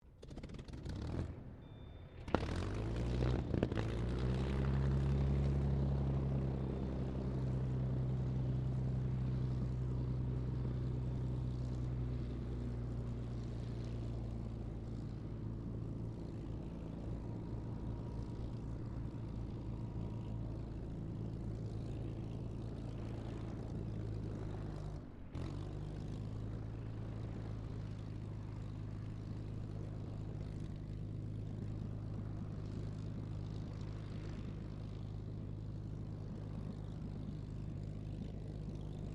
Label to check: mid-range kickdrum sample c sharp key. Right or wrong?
Wrong